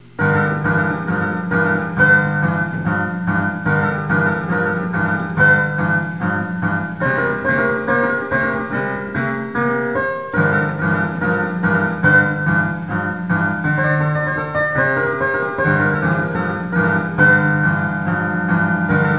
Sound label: bittersweet blues